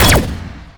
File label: art barrage fire; weapons; dota; gyrocopter